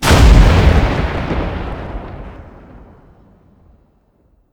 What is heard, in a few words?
dragonstick; wesnoth